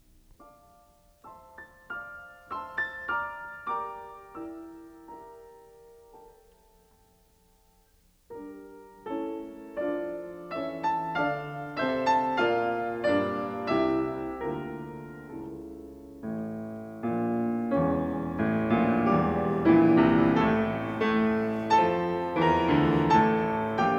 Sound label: Pictures at an Exhibition for piano Promenade; Mussorgsky